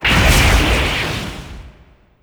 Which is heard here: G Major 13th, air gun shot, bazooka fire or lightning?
bazooka fire